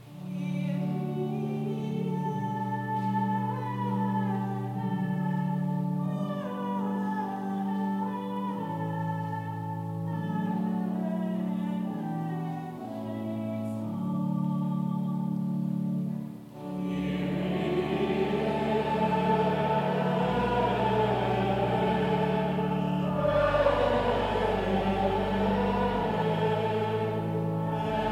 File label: Kyrie, choir; christmas 2019